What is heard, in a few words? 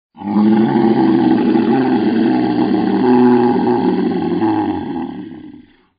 yell